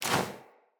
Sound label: Generic Weapon Aim Transition Out